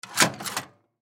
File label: Vending Machine Change Return Button Press Fast; Machinery; Foley; Blastwave